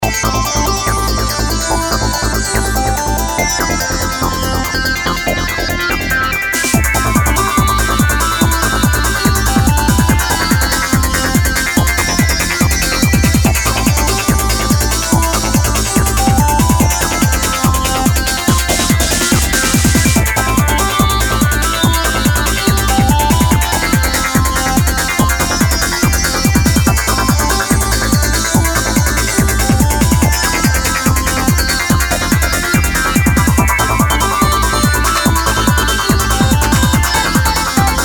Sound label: indoor, progressive trance